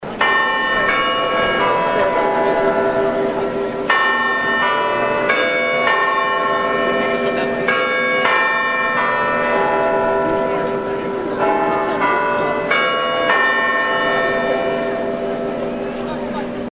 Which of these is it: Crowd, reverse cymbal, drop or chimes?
chimes